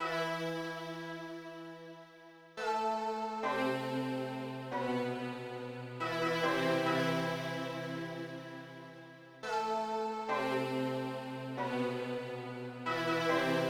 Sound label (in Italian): Melodia